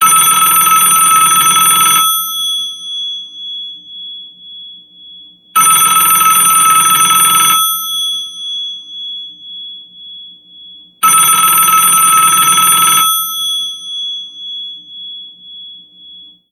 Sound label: Old bell, ringtones; SK telesys SK-S100